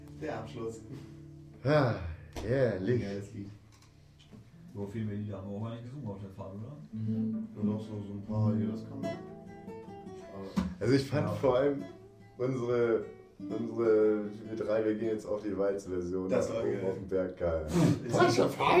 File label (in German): stimmen